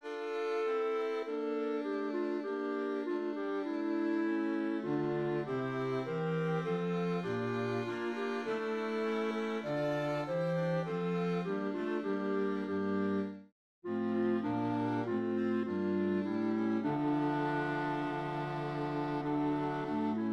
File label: clarinet; smyrna